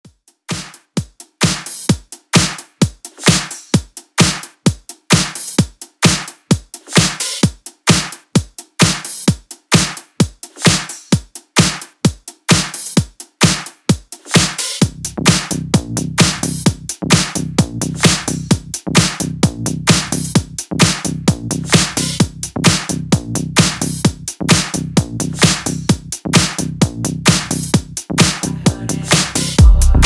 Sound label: indie house edit; clean; short edit